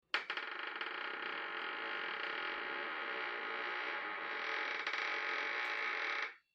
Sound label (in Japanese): ドアがきしむ音; door